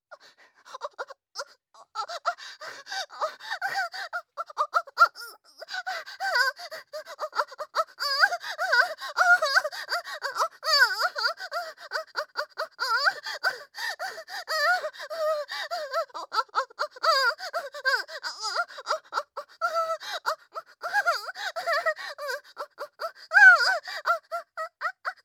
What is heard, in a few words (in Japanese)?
オホ声